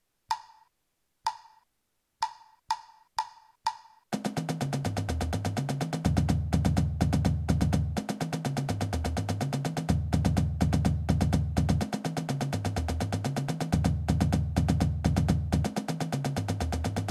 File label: 16th timing and diddle works